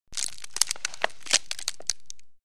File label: Board breaks; wood